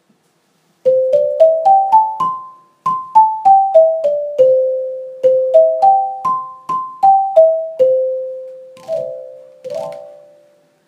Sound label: Fibreglass